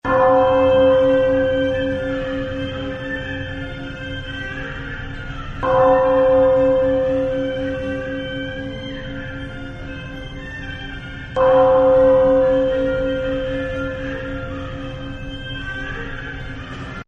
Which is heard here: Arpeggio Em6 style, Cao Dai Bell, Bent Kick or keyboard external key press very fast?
Cao Dai Bell